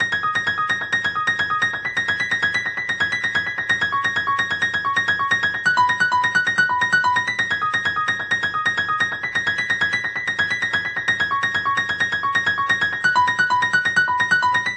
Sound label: 130 busy fast fingers piano; KEYS ORGAN PIANO LOOPS